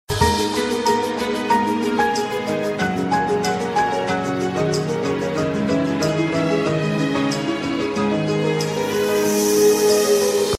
Piano

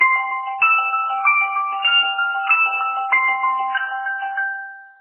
Chiming tone

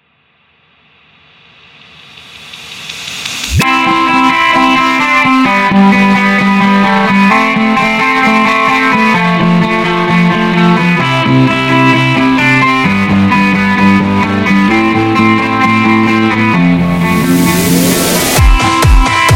drop

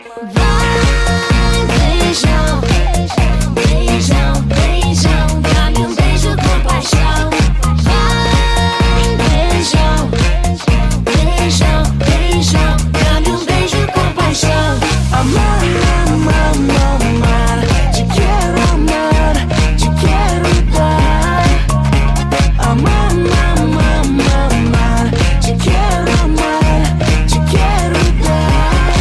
Narcotic Sound

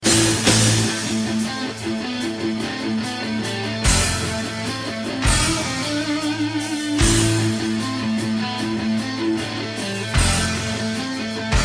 Riff Intro

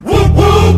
Whoop Whoop

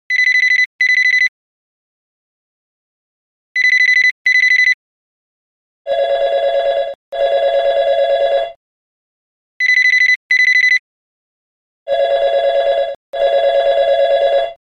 Cell Phone L G

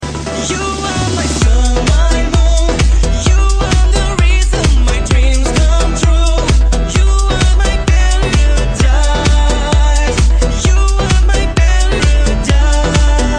Sweet Love Ringtone

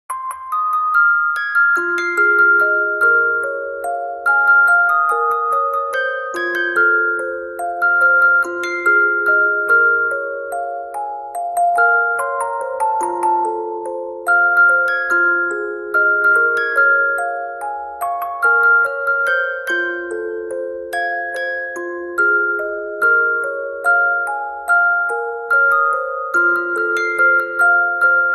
MusicBox

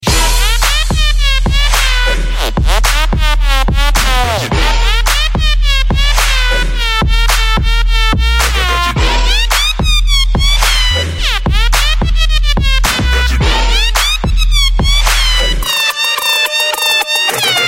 Trap Ratchet

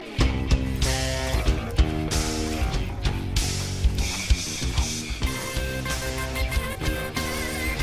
Rock Music

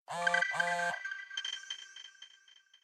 CellPhone; Division

